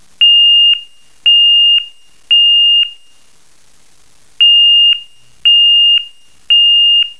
Intermittent 800-970 at 3x500ms Pulsed Sweep, 1.5s Silence, Repeat